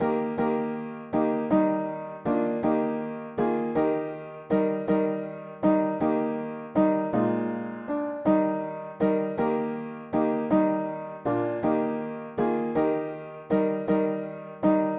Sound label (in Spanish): mono piano; hymns; Himnario pequeno